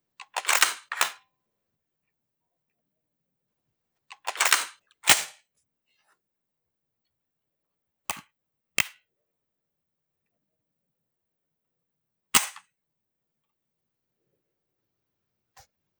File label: sks openlock openclose safety dryfire